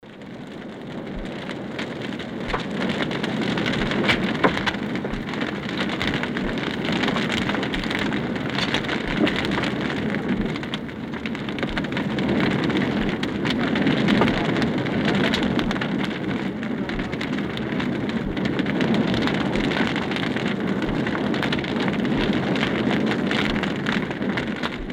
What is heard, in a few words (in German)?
Feuer